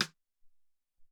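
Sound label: SR16 Stick, Alesis SR-18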